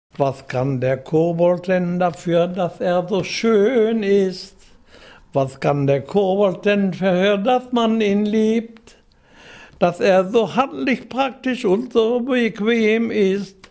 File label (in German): Kobold Lied